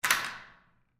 Stall Door Latch, Stall, Door, Latch, Lock, Public, Bathroom; Office; Public Bathrooms; BlastWave